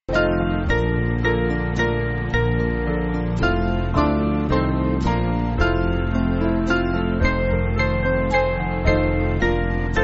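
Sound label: Band, Backing Files for Churches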